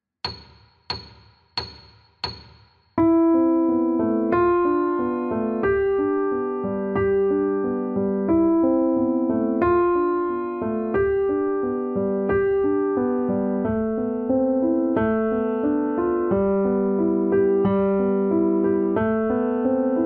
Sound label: Petit Prelude 90 metronom; male preludium